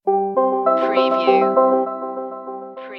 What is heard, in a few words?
transition stinger